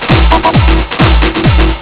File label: tekkno eq